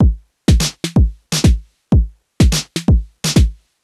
drum loop switch stripped 125, Classic Chicago House; Sample Magic